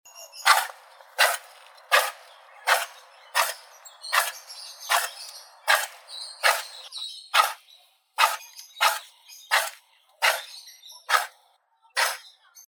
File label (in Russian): olen severnii rangifer tarandus